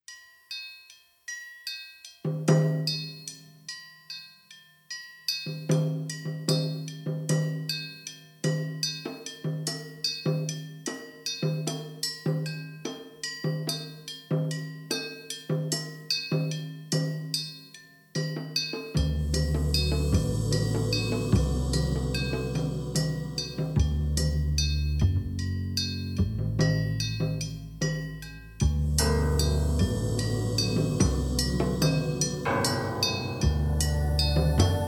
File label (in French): metal bata, percussion; citypercussion